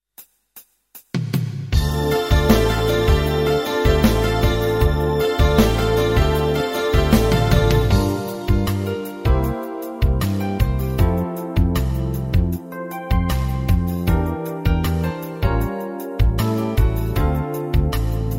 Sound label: brass minus